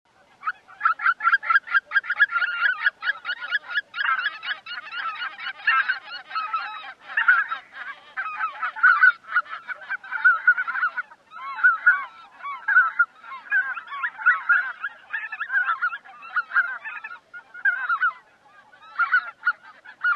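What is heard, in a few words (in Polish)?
białoczelna, gęś białoczelna; gęsi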